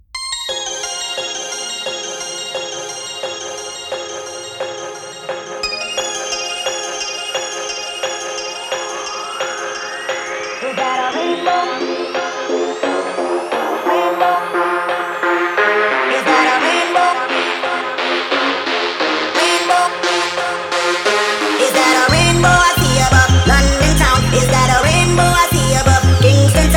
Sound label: Drum Bass JumpUp